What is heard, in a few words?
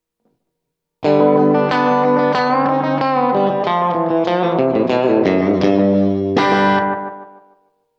Slapback clean